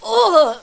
pain, male

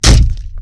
bang; svencoop

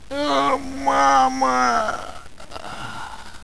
alpha die; svencoop; paranoia

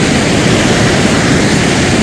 rocket; svencoop

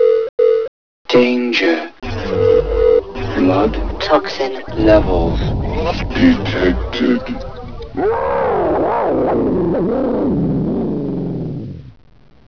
suit warn; svencoop

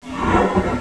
gonome pain; svencoop; cryocell

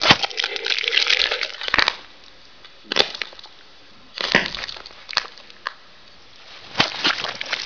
player eaten; svencoop; adams dungeon

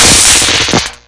spear electrocute; svencoop

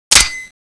slide release; svencoop

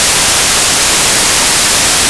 fuse loop; tfc; bombtag

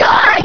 alert

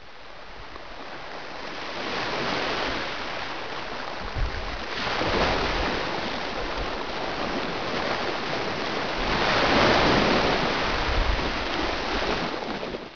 ocean

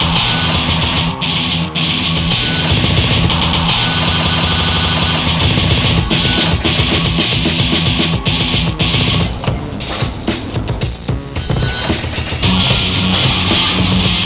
loop; svencoop; kyper kuutio4

